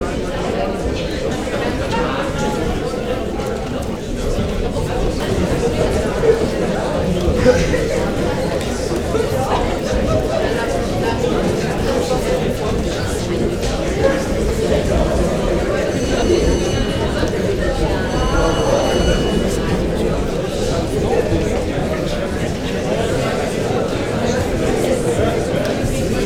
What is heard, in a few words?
crowd talking, interior; best service Blue Box; crowds interior